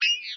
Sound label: Electronic Signal